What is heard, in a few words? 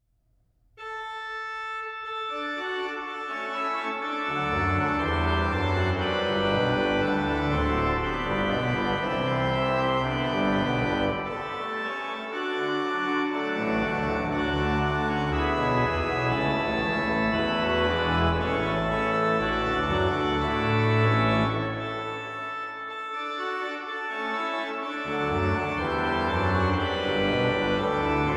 Front groot RW plenum; Bach Es ist das Heil uns kommen her BWV 638; schiedam